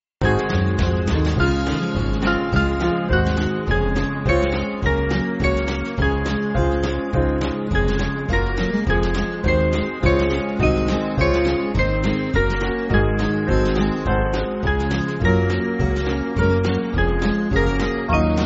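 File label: Band, Music Backing Files for Churches; Sing Alleluia Forth; Alleluia Perenne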